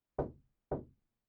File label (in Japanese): ノック